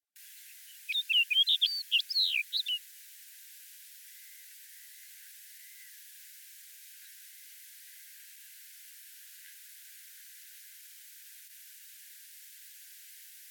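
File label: punochka golos, snow bunting voice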